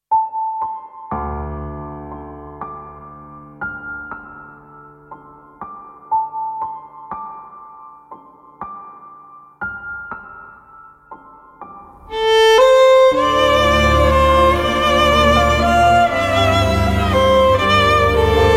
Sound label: Theme Instrumental